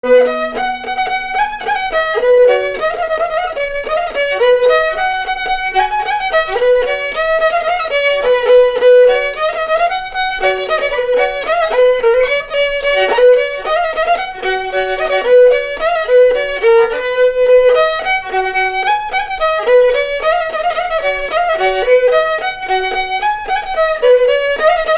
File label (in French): Polka; Polka de Meymac